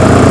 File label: midsize eng; Chase Hollywood Stunt Driver; XBOX